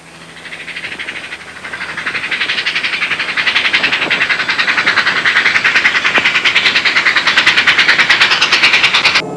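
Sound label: model train; trasporti; treni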